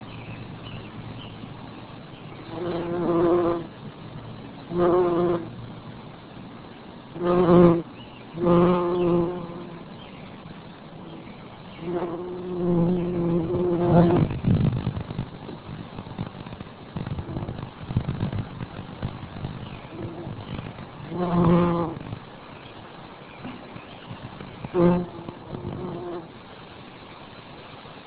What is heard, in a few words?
bumble b